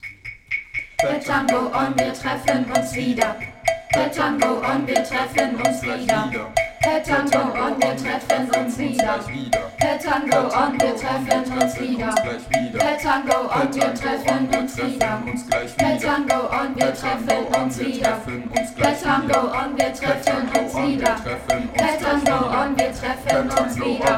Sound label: Minimal music Pattern